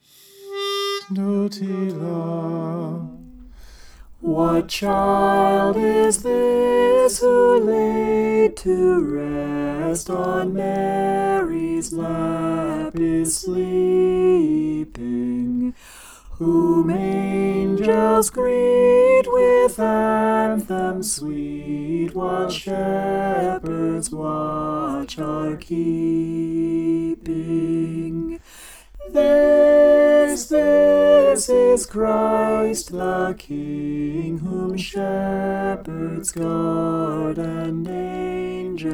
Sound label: soprano